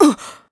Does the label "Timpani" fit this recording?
No